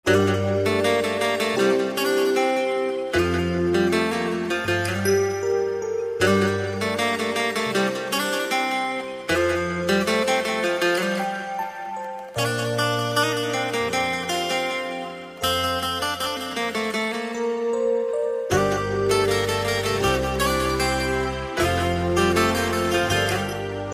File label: guitar ringtone; nhac chuong